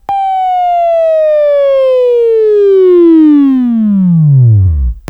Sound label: Buzz; Labview-Program; Sweeping Training program